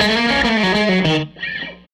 Strat Guitar Loop; Sonic Foundry (Sony Creative Software) - Six-String Orchestra

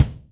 BD GMJ, Yamaha DTXpress Acoustic Kick

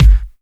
Dubstep Kicks

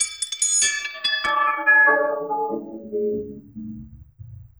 LOOPS

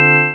Org Hit Dm; Digital Sound Factory Xtreme Lead

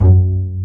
PIZZ BASSES; E-MU Formula 4000 Series Vol. 5; Protozoa; Proteus2 Instrmt

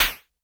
Closed Hat Metal; Lost Tapes From Mars

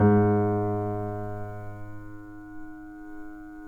PNO PP; Synclavier Sampler Library

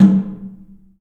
DRUM; The Piano; InVision Interactive

